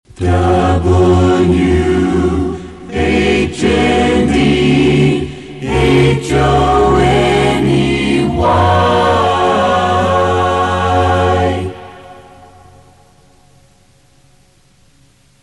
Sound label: Jingle; WHNE; Mickey Mouse